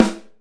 warm sounding steel snare drum sound a key, Royality free snare single hit tuned